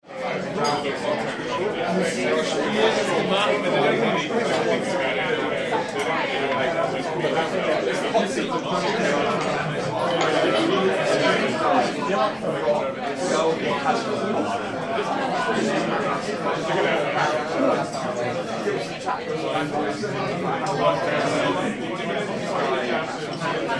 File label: Busy English Pub Bar